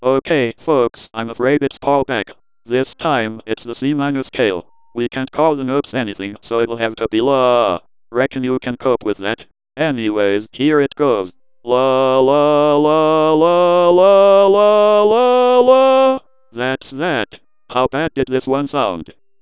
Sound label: c minor, DECtalk